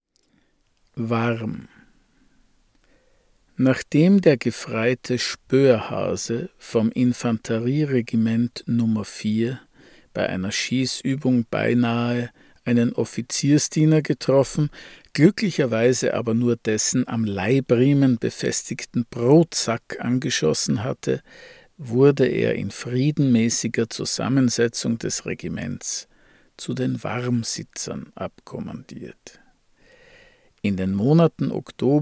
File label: Warm